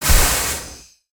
Full Steam Ahead Pulse

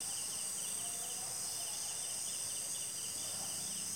Birds Loop; ninjago

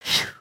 bored blow, emotes

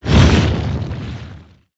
Earth Swing; Spinjitsu

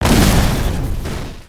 Impact

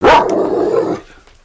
dog bark

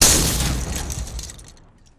vehicle death; aronwk collection; ndaudio

